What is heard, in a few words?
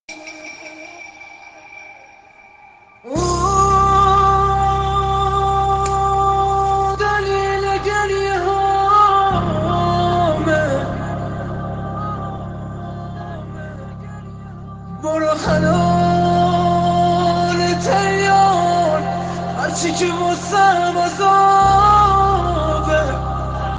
azan